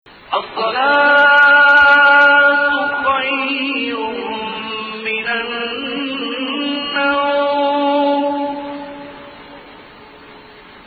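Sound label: Hijaz Adhan Hijaz, Adhan (call to prayer), prominent step below; 5 used in last phrase; Hijaz 1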